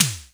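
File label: electronic drums; Crypton - DrumBase5000; ELEC.DRUMS#3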